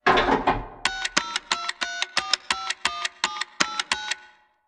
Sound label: payphone innocent